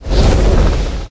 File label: slither; smite; kukulkan